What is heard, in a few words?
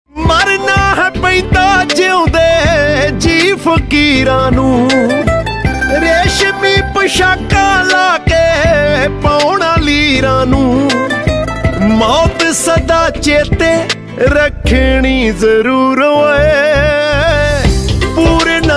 Desi Punjabi Ringtone